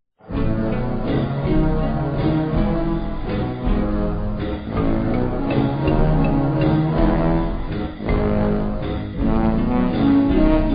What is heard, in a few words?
stage clear; vapesquared